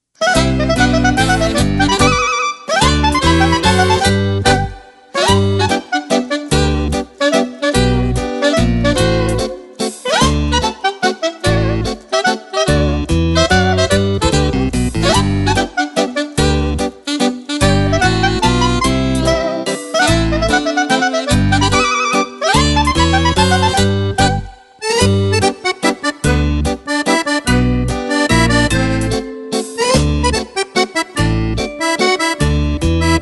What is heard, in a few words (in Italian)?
Mazurca